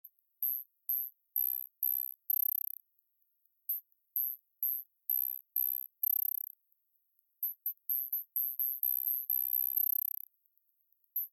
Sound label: noise